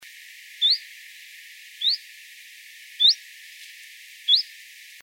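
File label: Chiffchaff (Phylloscopus collybita); 17.9.2003 Urserental, Uri, Switzerland; Linnut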